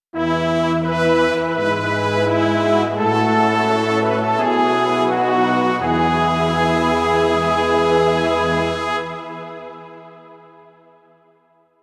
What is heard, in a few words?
Heavy